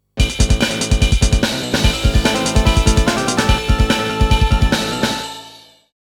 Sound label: Extra BGM 2 Primary Attack; Galaxy Force II & Thunder Blade Original Sound Track